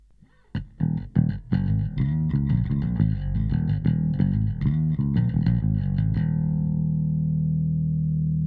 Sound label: Bass harmonics in out